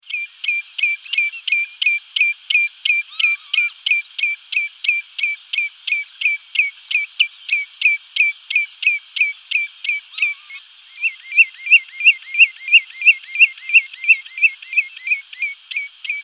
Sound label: Common Redshank